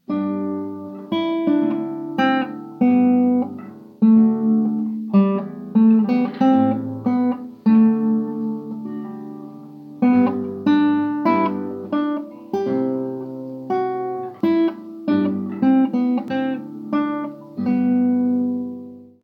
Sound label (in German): Gitarre; Alle Jahre wieder